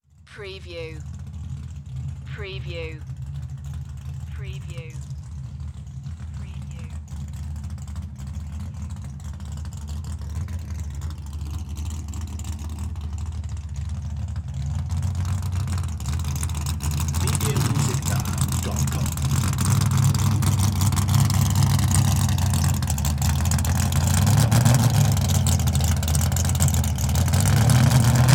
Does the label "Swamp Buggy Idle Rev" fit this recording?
Yes